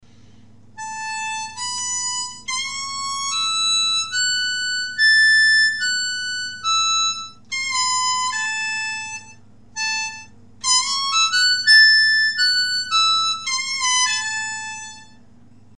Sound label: High End Country Scale in A 1st Position, harp